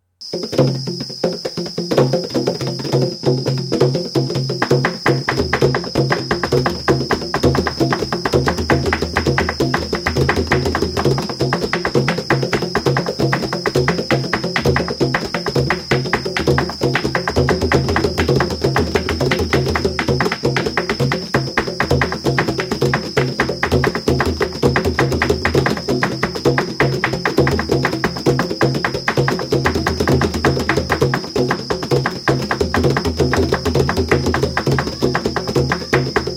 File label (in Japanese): 合奏（太鼓合奏曲チヤンダ）; africa